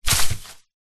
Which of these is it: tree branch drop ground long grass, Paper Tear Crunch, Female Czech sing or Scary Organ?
tree branch drop ground long grass